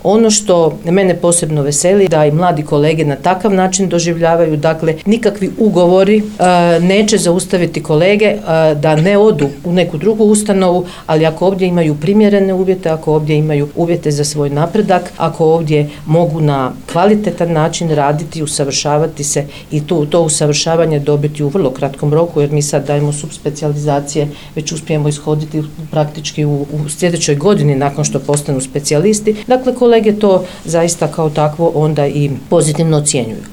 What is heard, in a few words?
POP